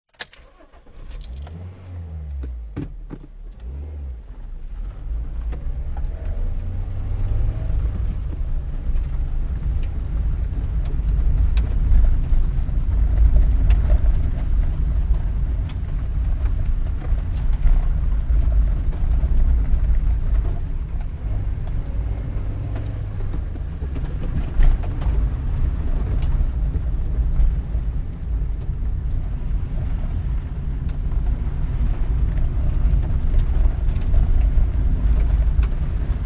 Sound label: car suv start away drive stop bumpy track internal; vehicles